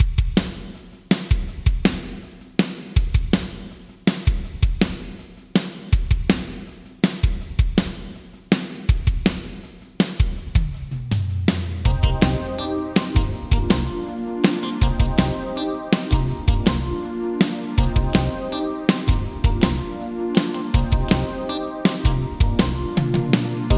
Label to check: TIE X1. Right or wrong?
Wrong